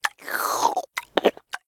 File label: Zombie Eat